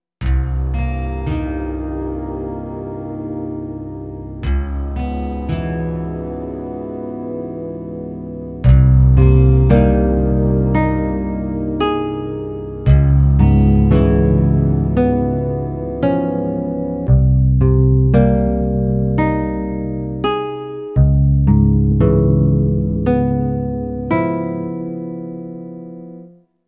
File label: water tower